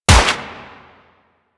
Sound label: Uzi Shot; Warframe; Tenno